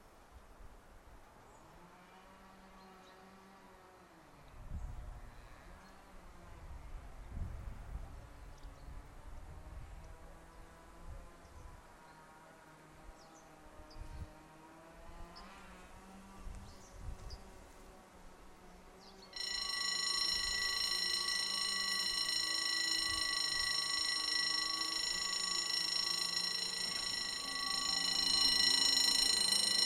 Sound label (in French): Passage à niveau